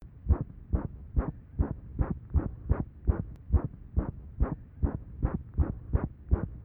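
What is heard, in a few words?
Auscultation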